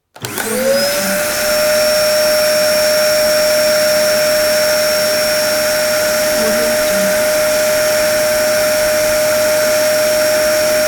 vacuum cleaner

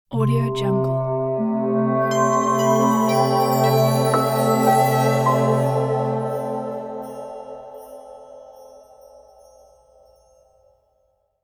cold fairy logo

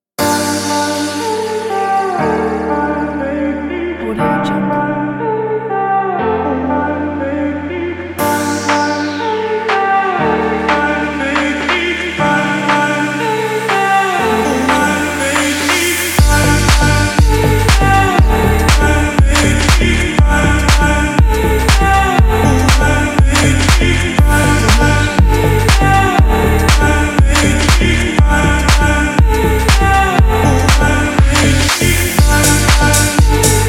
deep house

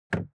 transportation suv gas cap close soft

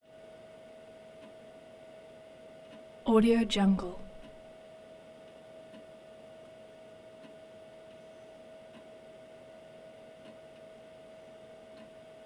hard drive compaq pc hard drive; prosoundfx

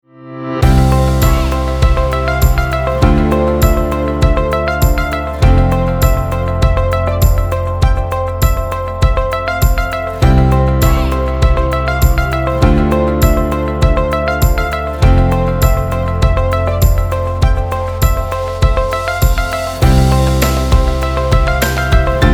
corporate real state

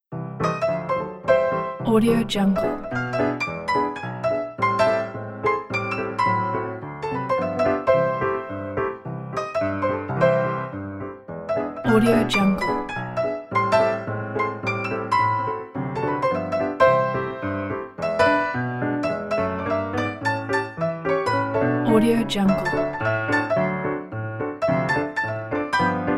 rhapsodic ragtime

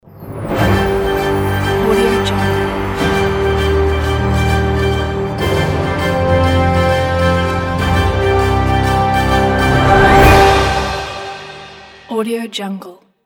news theme; blueskyaudio

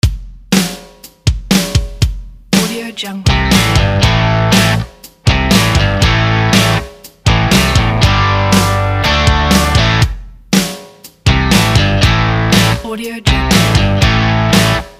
driving rock

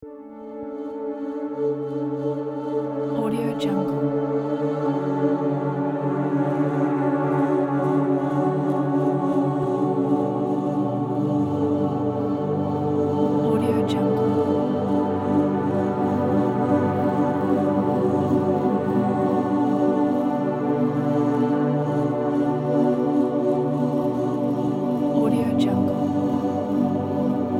yoga meditation

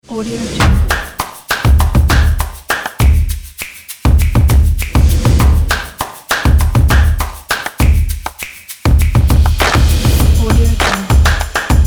that is percussion

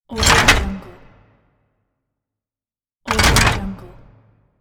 big metal lever

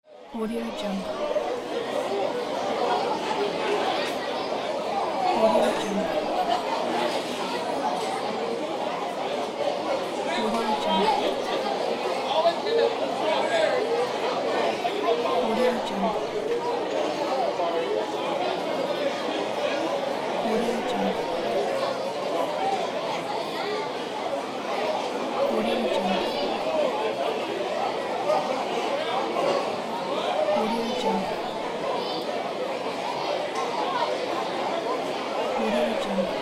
restaurant ambience

